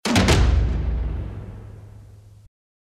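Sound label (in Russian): двойной удар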